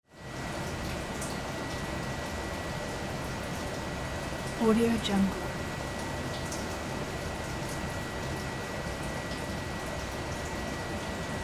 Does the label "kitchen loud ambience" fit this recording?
Yes